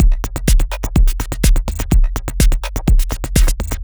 125bpm, House Drumloops

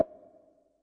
snap, Yamaha Tenori-On Snapkit

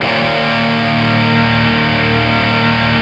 E 3 SOLDAN, Guitars, SOLDANO ROCK; Spectrasonics; Hans Zimmer Guitars VOL-1

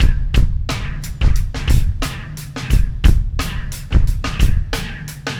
Landing Party Beat, Processed Drumkits

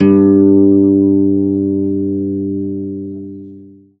ZITHER BAS; F2; Best Service; Alpine Volksmusik

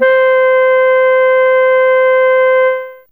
Clar Hi C4, Fairlight CMI IIe Reeds

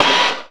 HOLLOW RAK, PERCUSSIVE; Rarefaction; Poke in The Ear With A Sharp Stick

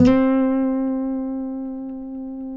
FINGER, Guitars; TAKA

